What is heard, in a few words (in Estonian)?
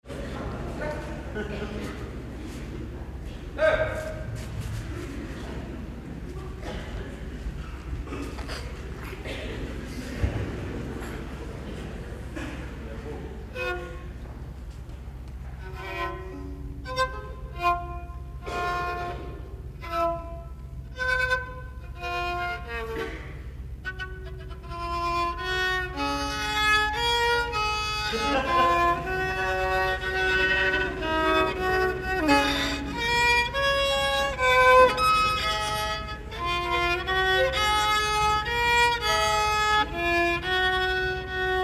Viiul